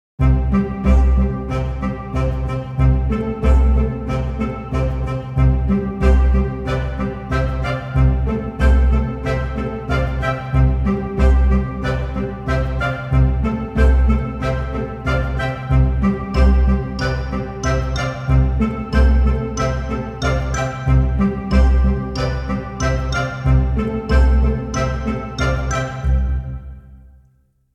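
fun background music for short video vlog cheerful light accompanying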